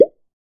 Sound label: Popup